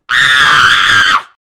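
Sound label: visg jenshin, woman scream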